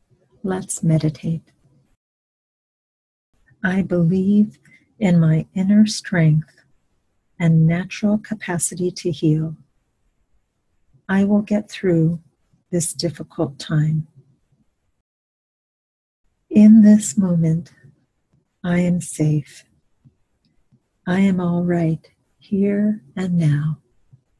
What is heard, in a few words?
meditation